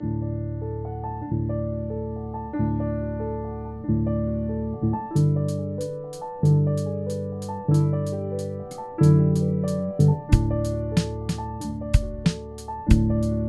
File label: bass piano indie